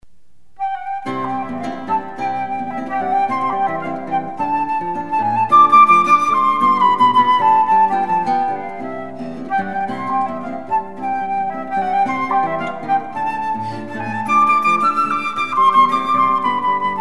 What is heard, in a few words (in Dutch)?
fluit tumba